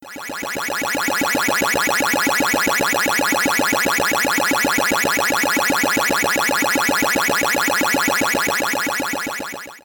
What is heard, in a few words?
ghost turn to blue; pacman